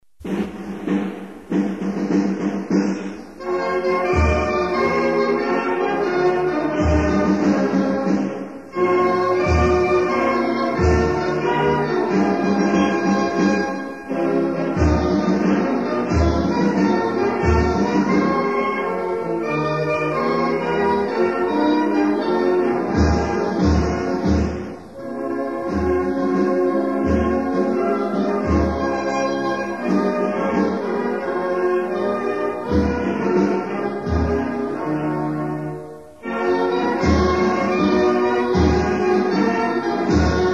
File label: african union (instrumental)